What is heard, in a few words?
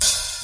Smooth Open High-Hat Sound F# Key, Royality free open hi hat sound tuned to the F# note